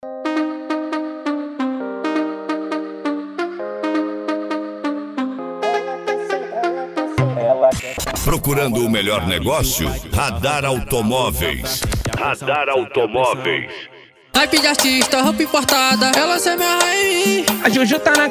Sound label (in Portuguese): pagode e funk